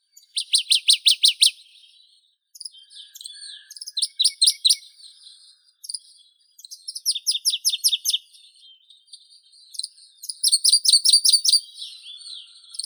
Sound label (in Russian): penochka buraya phylloscopus fuscatus; zvuki prirodi